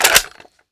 mag in; Urban-Coalition; Decay; arccw ud

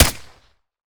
fire sup, glock; Urban-Coalition; Decay; arccw ud

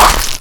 melee arm break, violence; Benny Placeholders

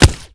fire supp, glock; Urban-Coalition; Decay; arccw ud

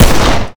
fire, glock; weapons; Urban-Coalition; Decay; arccw ud

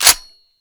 ch forward, weapons, m14; Urban-Coalition; Decay; arccw ud

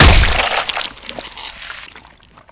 bust flesh, violence; Benny Placeholders

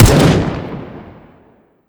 fire, m16 weapons; Urban-Coalition; Decay; arccw ud

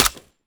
mech, m16 weapons; Urban-Coalition; Decay; arccw ud

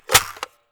mag tap, weapons m82; Urban-Coalition; Anarchy; arccw ue

